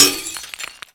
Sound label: Glass Impact; Warframe